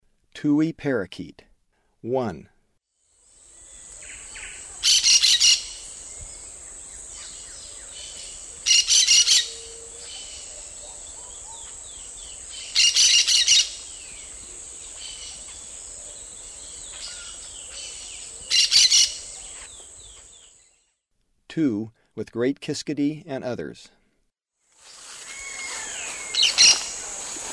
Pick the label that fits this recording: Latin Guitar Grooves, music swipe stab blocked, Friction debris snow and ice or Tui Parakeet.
Tui Parakeet